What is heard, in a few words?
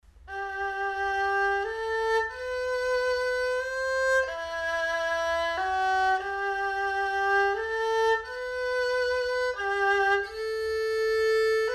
erhu